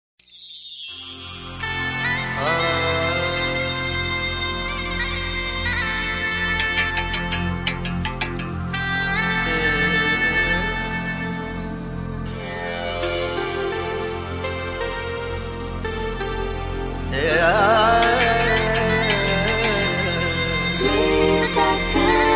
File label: Instrumental